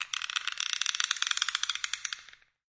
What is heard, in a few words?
Thumb Screw Turns, gears